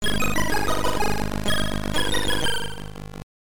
Unused Tune, C64 Musics; Bomb Jack; StArkos

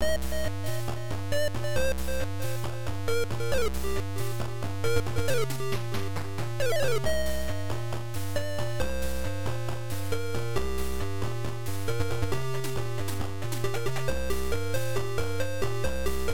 Music HardRock; Magic Sound

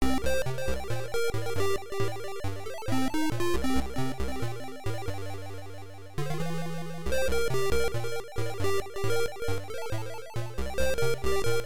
Music Impro; Magic Sound

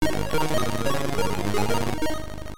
Sub Hunter Jingle; Psytronik Software; Tom & Jerry of GPA; StArkos

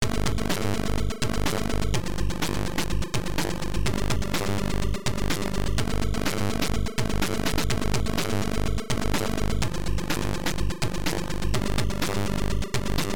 Chainsaw Ditty; Coder M.I.A.; StArkos

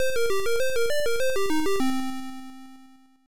Menu; Sos Music; Amstrad Cent Pour Cent

